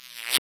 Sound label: boing; Spectrasonic Distorted Reality 2; BEMUSED